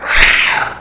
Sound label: pain; Alien Arena; xenoid